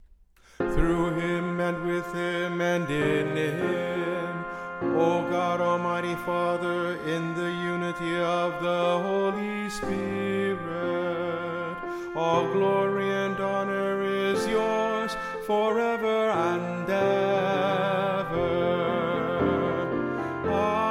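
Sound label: Presider 2 part Choir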